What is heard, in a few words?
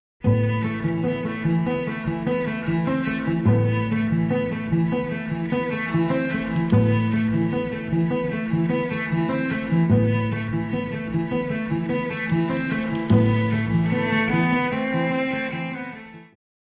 Cello